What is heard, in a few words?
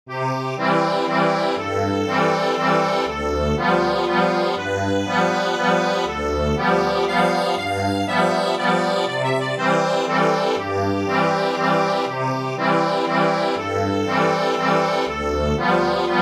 ACCORDION